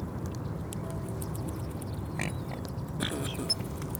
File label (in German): hoeckerschwan